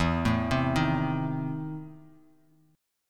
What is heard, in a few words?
E Major 7th Suspended 4th Sharp 5th, Bass Standard; EM7sus4#5 arpeggiated